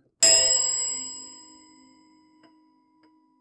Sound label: a single high pitched chime